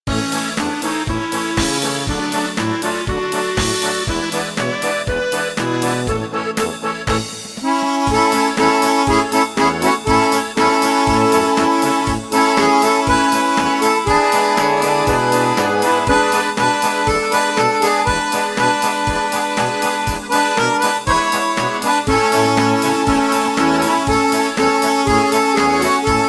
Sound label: Polish melody of love